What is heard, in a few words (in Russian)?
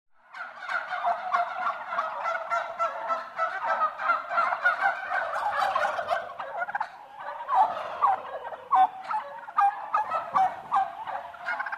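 zvuk indukov, turkey sound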